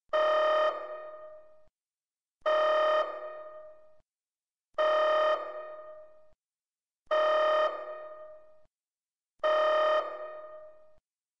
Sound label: red alert, klaxons